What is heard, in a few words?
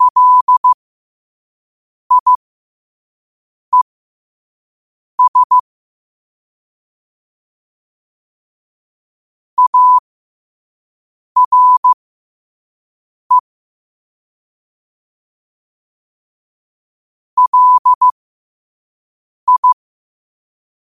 5 wpm, morse